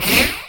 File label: Shoot - Missile; Captain Bumper 0.58